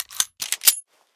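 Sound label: Weapon 9mm jam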